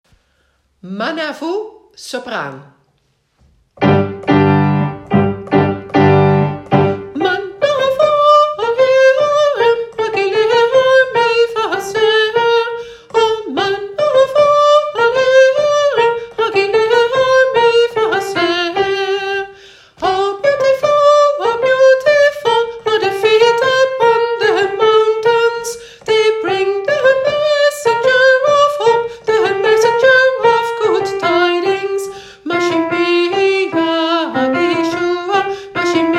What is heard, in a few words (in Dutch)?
ma navu sopraan